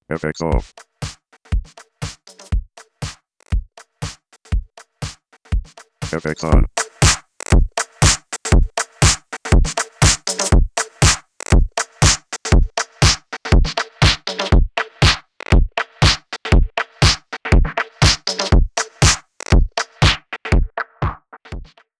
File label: drum; ableton live